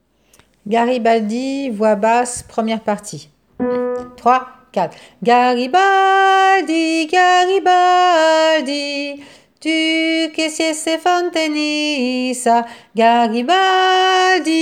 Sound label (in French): voix basse